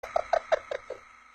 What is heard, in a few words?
hihihi